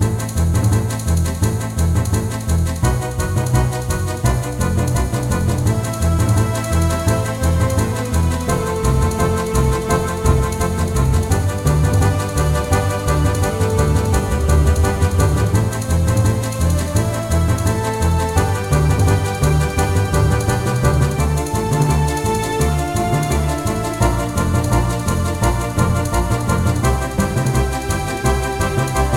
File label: Polka; World of Goo